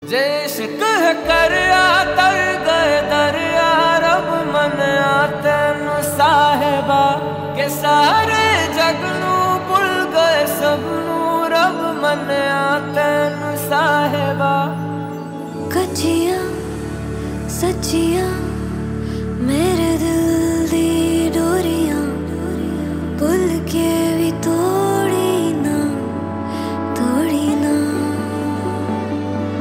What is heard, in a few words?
Ringtone